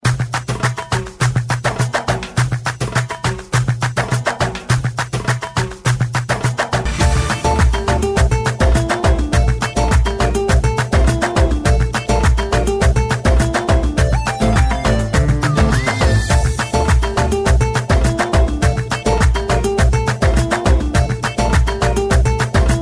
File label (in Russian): vostochnaya muzika